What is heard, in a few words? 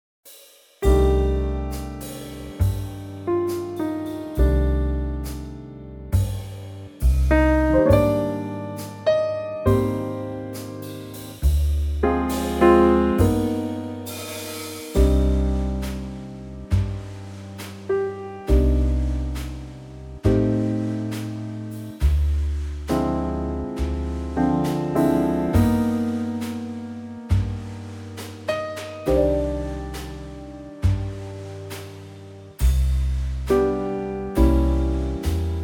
Trio; Cm Trio; key - Cm - vocal range - Eb to Ab